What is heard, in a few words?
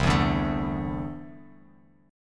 C 6th Add 9th C down Bass Standard; C6add9 strummed